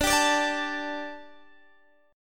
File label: D Suspended 4th D, Guitar, Open D